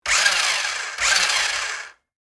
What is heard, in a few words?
RC toy car remote controlled short rev; rc toys sound effects